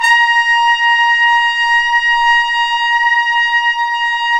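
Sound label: brass, Tpts mp f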